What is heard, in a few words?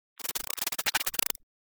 Insect Wings Flapping Movement Single; Hollywood Edge; sound designer's toolkit v2